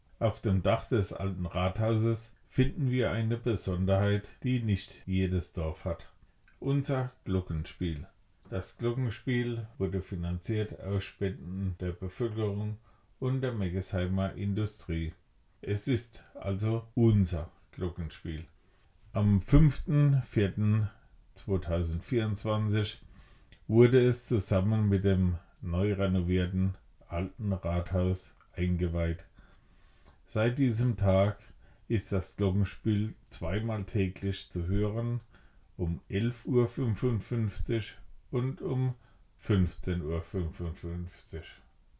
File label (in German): Glockenspiel; Station 3